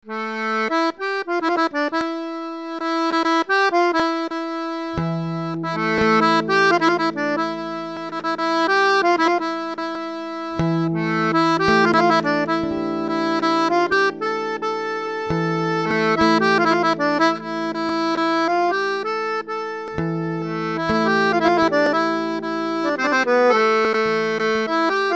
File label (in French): Gavotte Ton simple